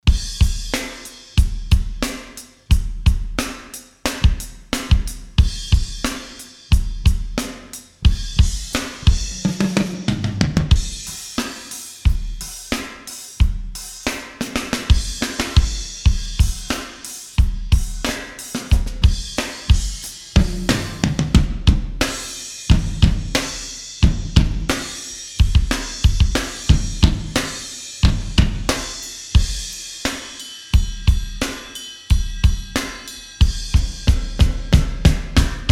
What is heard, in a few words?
Drum Mix Sample